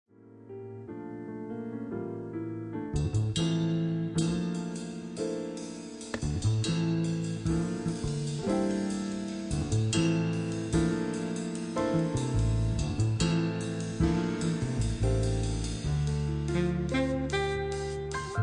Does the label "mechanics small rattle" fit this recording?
No